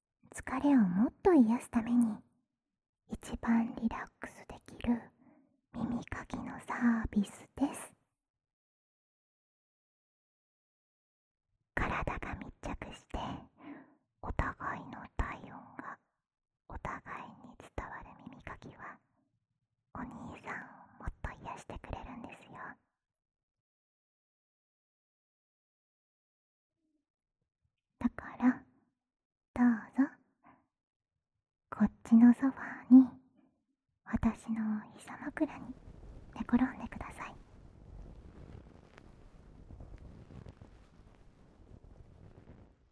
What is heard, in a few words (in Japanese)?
癒しの耳かき, ASMR